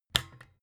desk lamp on hard

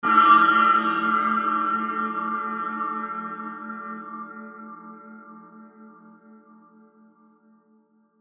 tibet sbub chai cymbal

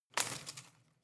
home plant leaves rustle hit

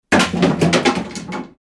broom chamber

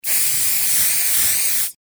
bathroom spray

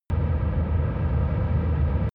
drone spaceship rumble hard

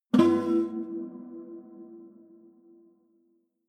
low string buzz dissonant high

